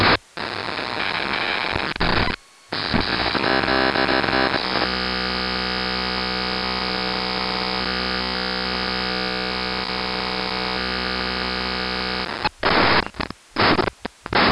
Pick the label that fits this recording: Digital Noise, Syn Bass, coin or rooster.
Digital Noise